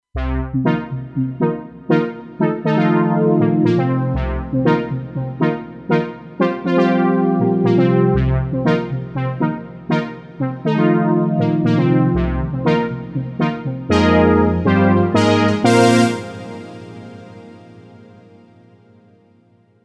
Cotton Wool, PAD; ROLAND SH-201

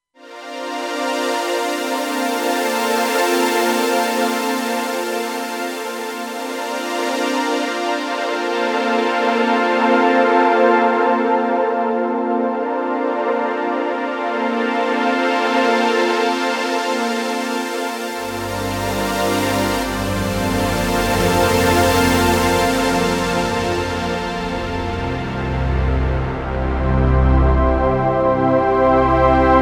Moving Strings, PAD; ROLAND SH-201

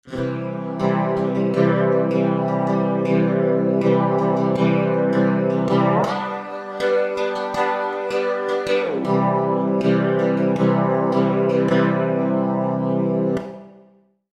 Tap TTP Strum, V-Synth

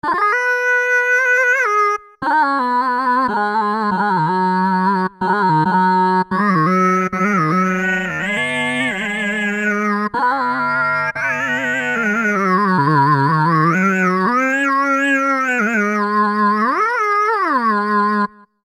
Tin Voice, V-Synth

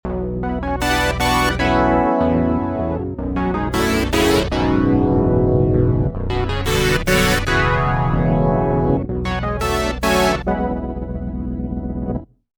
Triad, V-Synth

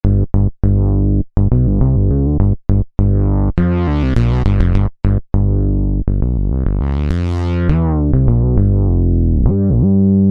Wonder Bass; V-Synth

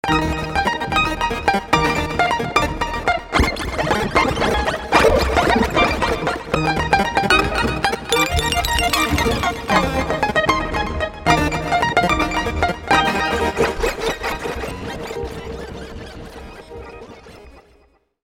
Innocent Key, V-Synth

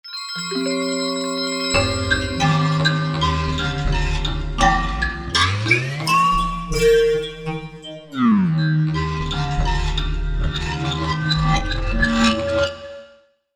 Bali On Vari, v-synth

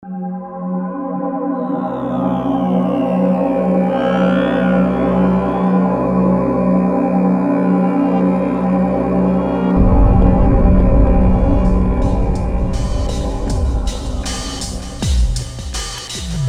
Into Darkness; V-Synth

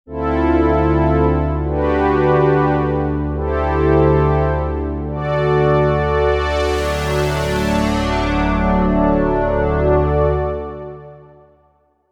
JP8 Str, v-synth

